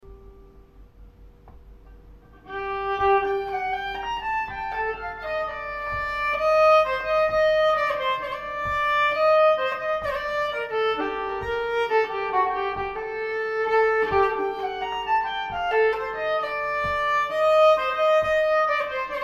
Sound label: valsen Rapide, nyckelharpa; Nordsjovalsen Rapide